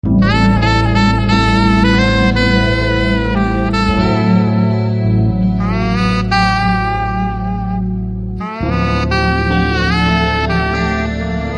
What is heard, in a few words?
SEXYSAX INTRO